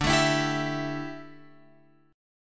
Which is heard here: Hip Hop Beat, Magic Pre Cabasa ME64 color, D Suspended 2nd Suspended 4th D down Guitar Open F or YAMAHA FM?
D Suspended 2nd Suspended 4th D down Guitar Open F